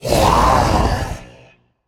grunt attack, voicepack; smite; jorm

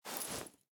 cloth; smite; daji

